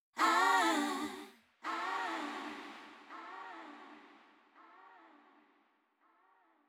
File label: choir washed away; colour delay by HOFA Plugins